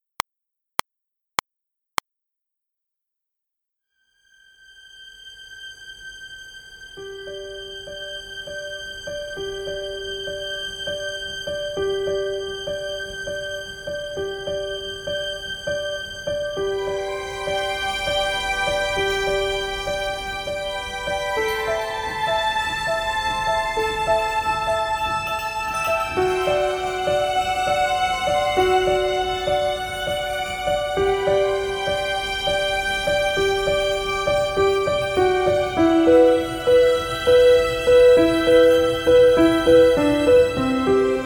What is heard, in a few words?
Backingtrack BPM 101; Forrest Gump